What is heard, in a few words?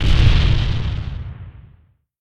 Explosion; LOOPERS